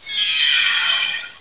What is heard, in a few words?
Grassy Square Appear